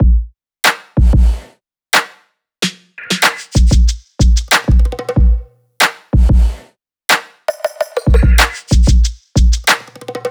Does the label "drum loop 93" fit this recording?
Yes